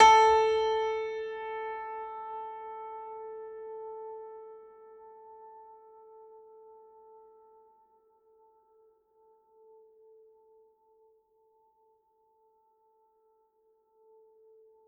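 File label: Classical Piano A3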